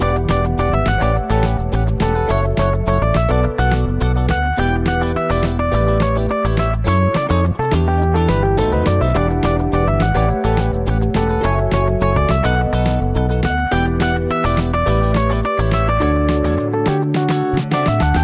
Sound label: bgm random dice